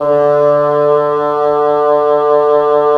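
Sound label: wind bassoon; LA Composer vol. 3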